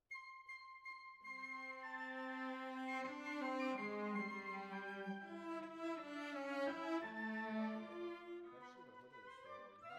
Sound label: Cellos Over